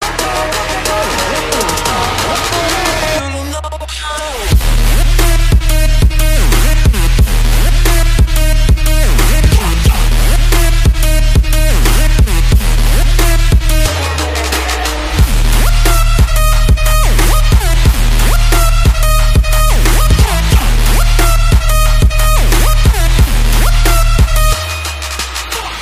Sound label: trap sound